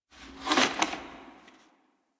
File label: Foley Hand Off Sword; Cinematics; Stalker; Warframe; Orbiter Cradle